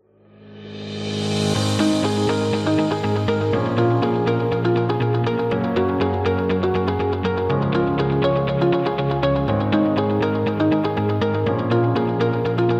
Promo Happy

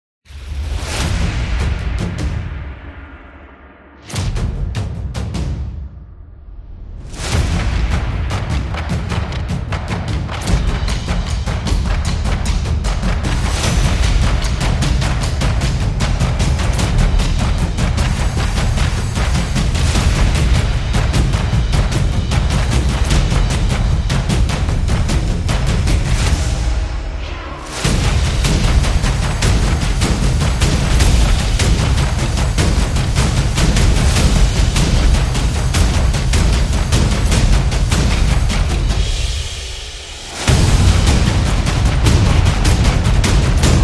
Japan Taiko, epic drums; This Is Japan Taiko

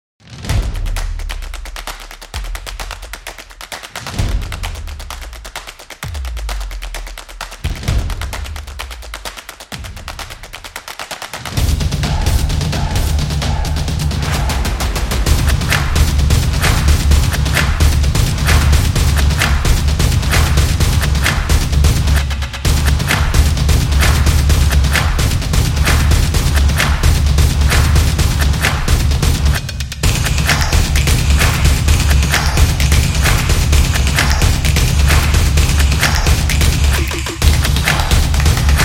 High Energy

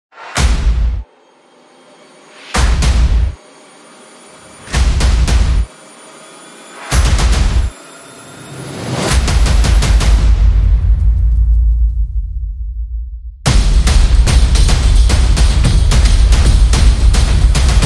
Cinematic Drums

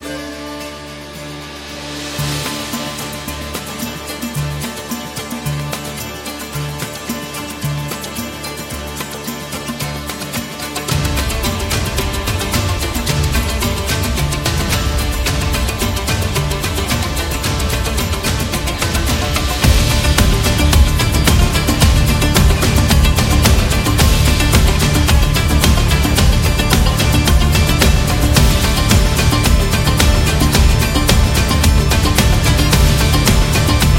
Upbeat Arabic; ramadan 2025

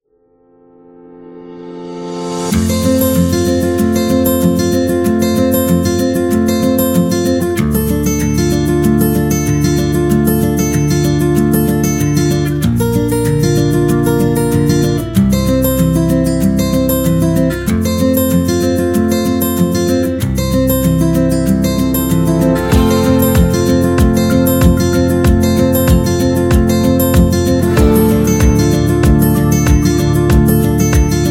Guitar Upbeat, happy music

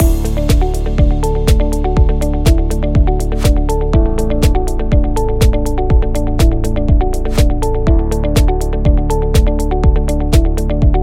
Podcast Loop Interview

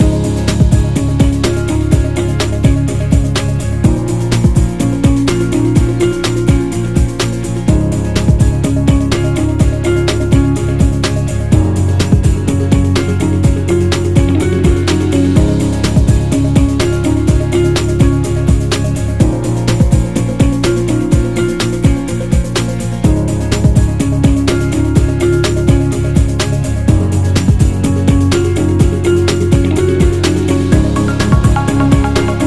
Loop Corporate

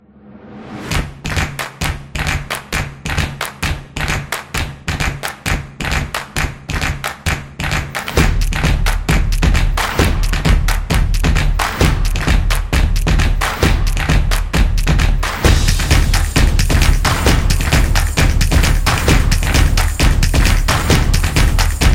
Percussion Upbeat

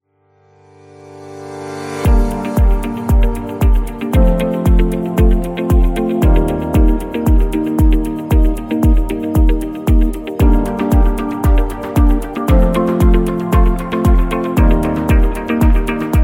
Corporate Fashion, corporate music; corporate music vol 14

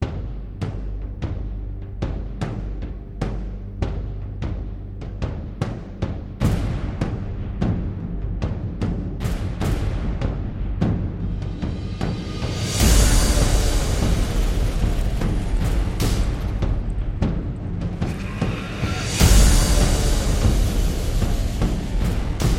Epic War Trailer, epic drum

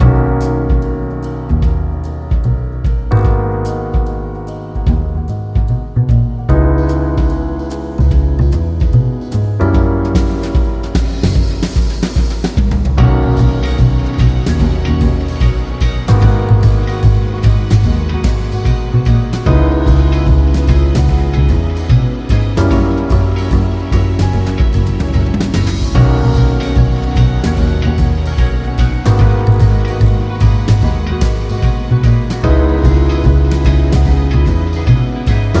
All That Jazz, jazz club

